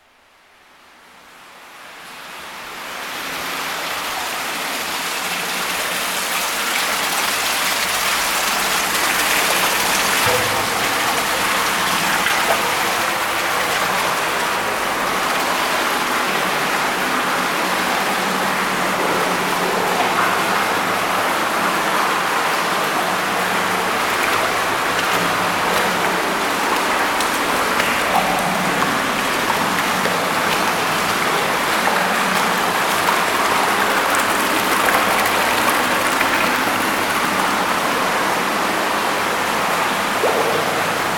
Bains Truc

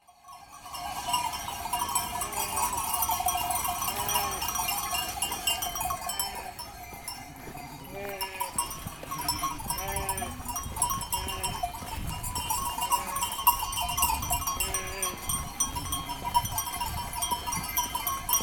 Grèce Crète Moutons

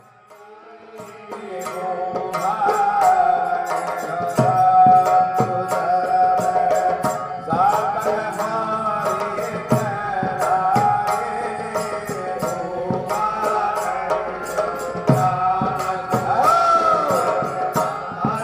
Musique Qawwali

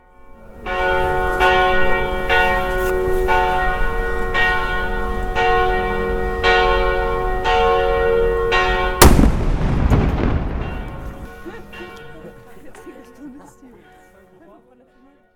Canon de Zagreb

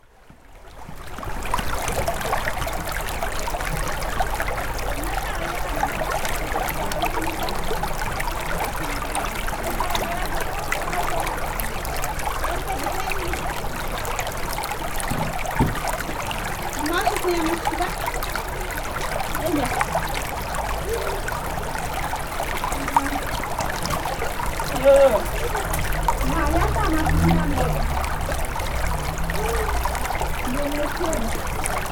Les canaux d Ollantaytambo

